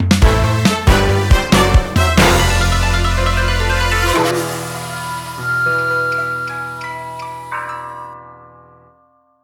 Bpm138 Lose; oninaki; ps4 switch windows; Katsugeki

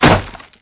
Zombie Dig

